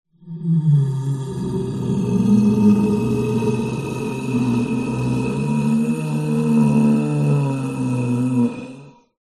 horror monster evil growl long dying; zapsplat

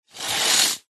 household curtains old draw pull

